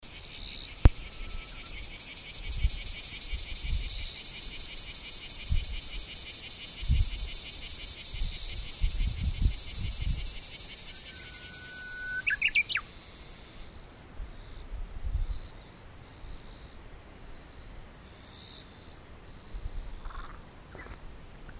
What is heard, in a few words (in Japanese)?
uguisu kirigirisu